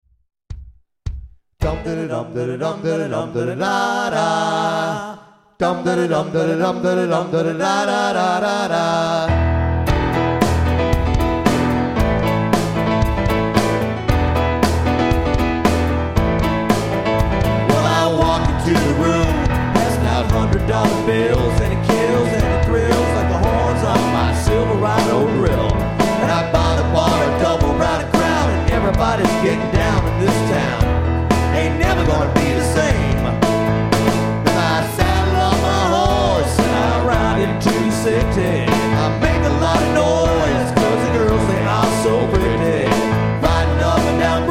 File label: Dueling Pianos